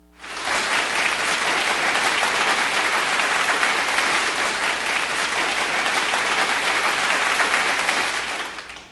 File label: applause